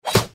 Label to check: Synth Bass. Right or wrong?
Wrong